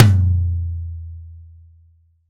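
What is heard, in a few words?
TY Low Tom, Roland BK-5 Toms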